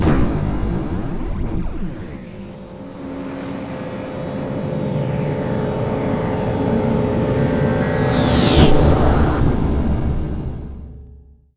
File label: Vortex; PC Gamer IT CD 41